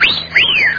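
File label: person whistling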